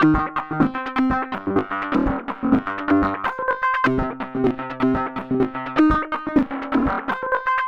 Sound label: synth 125 lumpy C Min; Transmission-X